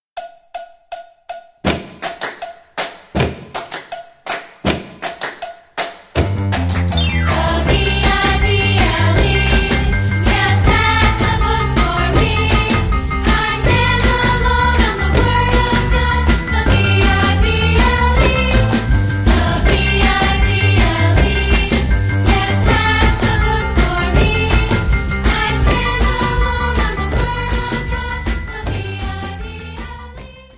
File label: Vocal